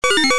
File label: Pre Death, arcade; Dig Dug